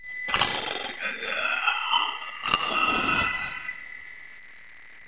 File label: lady attack